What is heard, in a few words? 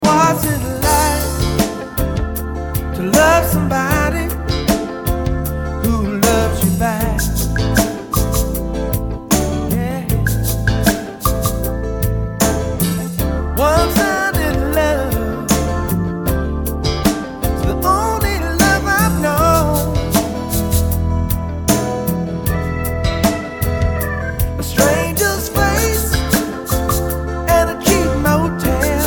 Pop Perc overdub